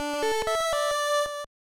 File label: CG Jingle; grand dad